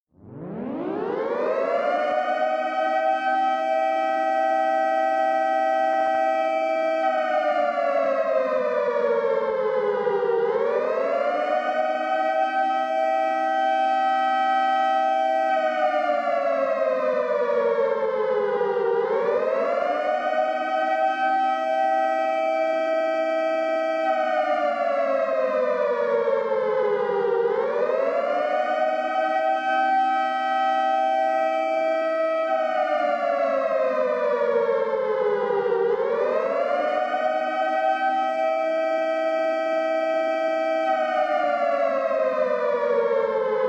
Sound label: War siren air raid siren alert emergency distant perspective